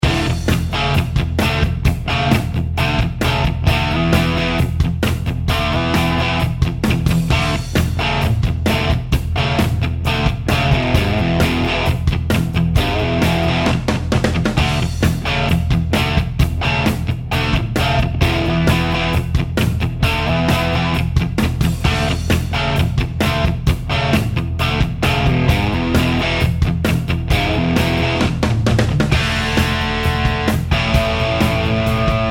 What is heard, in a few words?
Crunch Combo Style